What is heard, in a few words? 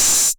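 808 open hi hat Tape Sat; Goldbaby Tape808 & Tape909; The Tape 808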